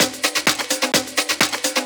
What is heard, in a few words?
Latin Loop 128 BPM